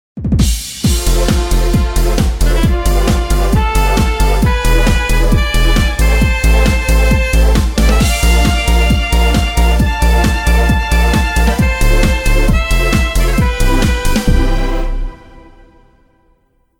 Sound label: dance event, bgm